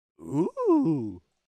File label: Ooo